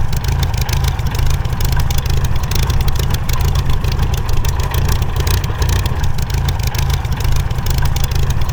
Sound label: Aircrafts Engines Rolls Royce Merlin; DCS